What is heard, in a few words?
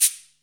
shaker; Zero-G; Total Drum Bass; Drums Percussion